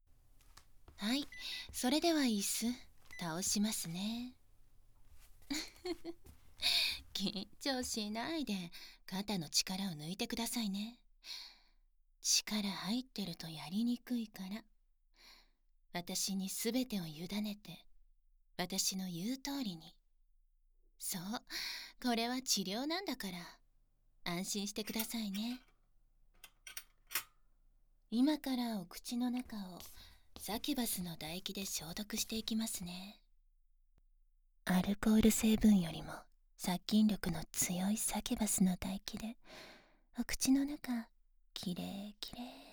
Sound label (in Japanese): ディープキス, ASMR; 口内ディープキス消毒